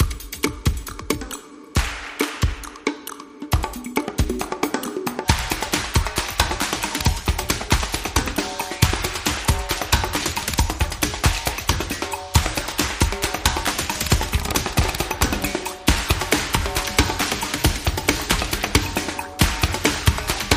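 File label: Wonder Lights at Dawn, Percussion Only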